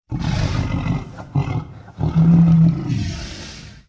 Dinosaur Design, Vocals - Monster

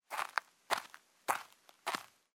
Footsteps; Microsoft Studios; Age of Empires III - Complete Collection; cinematics

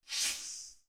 Zing; Title Sequence Effects; Sony Pictures Sound Effects Series

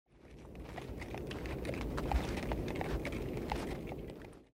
Ambience

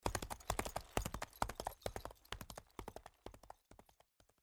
Gallop; Age of Empires III; cinematics

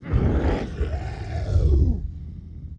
Guttural Snarl, Vocals Monster; Sony Pictures Sound Effects Series

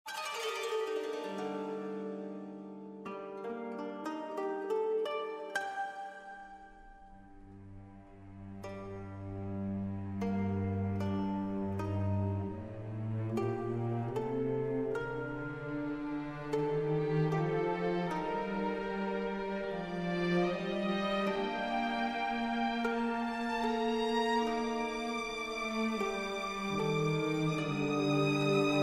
Music; Age of Empires III; cinematics

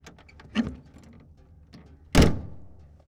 Plymouth Door Open Close; Sony Pictures Sound Effects Series; Period Vehicles

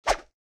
Sword Movement Single; Weapons & Firearms; Sony Pictures Sound Effects Series

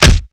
Kung Fu Old School Hit, Hand To Hand Combat; Sony Pictures Sound Effects Series

